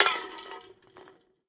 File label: Hit Soda Can Drop; SFX Kit; Foley - Misc